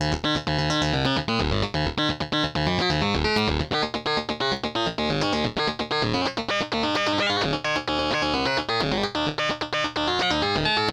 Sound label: FUNKY, SYNTH SEQ; AMG; Global Trance Mission VOL-2